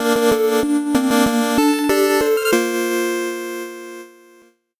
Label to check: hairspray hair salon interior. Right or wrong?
Wrong